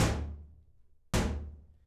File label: Hitting, Workshops